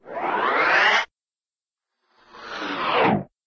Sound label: entrance tube; cartoon; jetson's; hannah-barbera